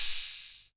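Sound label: 808 open hi hat Tape; Goldbaby Tape808 & Tape909; The Tape 808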